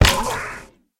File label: land hit; toxifin